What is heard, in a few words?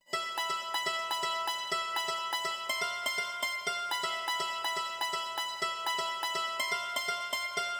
123 Dance Koto E; Dance DJ; Pro Tools Essential Demos; Digidesign; Essential Loop Demos; Demo Session - Dance DJ